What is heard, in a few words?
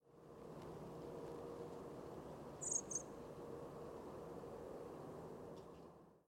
blackbird double call